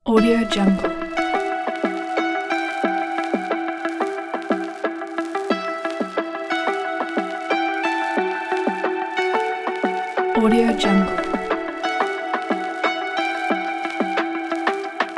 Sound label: inspiring hiphop; liquid-sound